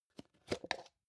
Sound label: plastic can small lid open soft